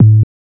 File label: Bounce Up; FREE SFX PACK VOL 3